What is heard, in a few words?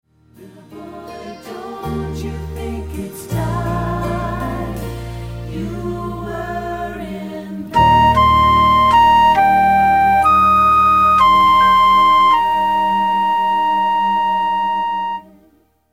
flute ending with track; Flute Ending - With Vocals; Rivercrest Instrumental Music